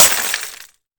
glass

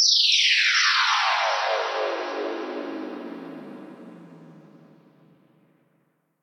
square fx

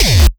gabba

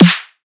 soft hit clap; osu

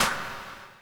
echo snare

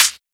kick

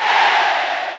call yeah middle

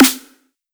punchy snare

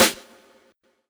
soft hitwhistle

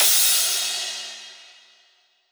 Crash; osu

